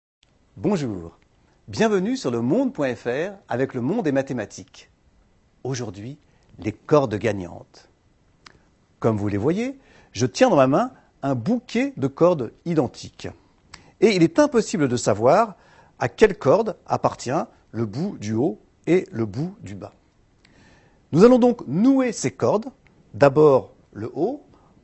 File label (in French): Cordes